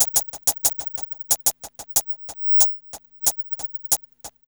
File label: RNB 92